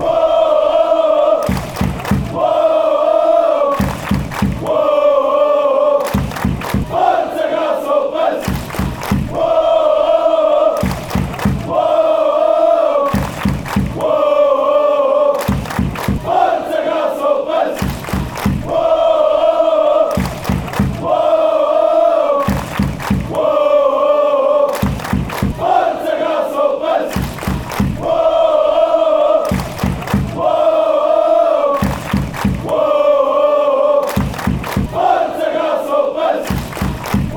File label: woah woah woah forza, fan chants